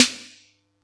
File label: Yamaha EX-5